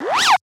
Lazer

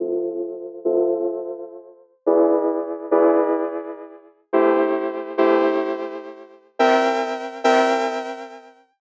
Pad A 106 Amin Dry

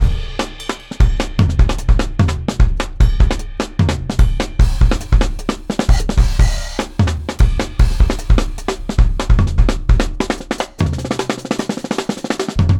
150 kit 8 bar loop; Bonham

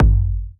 kick oneshot organic

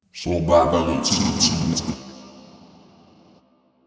Vox

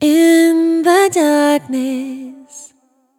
Am One Shot In The Darkness Wet, 110

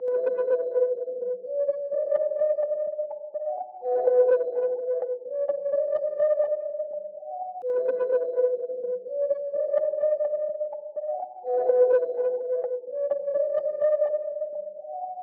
FX 126 Bm

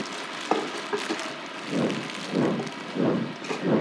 126 TEXTURE LP Ab

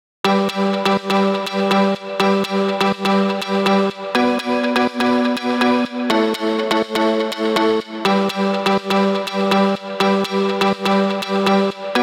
House 123bpm Chords; Love My House Kit 2

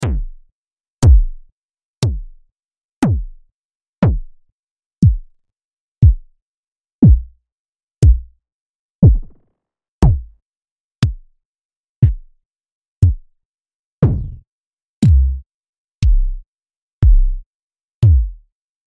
100 Kick Drums

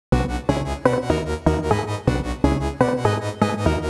B Synth Shadow, 123

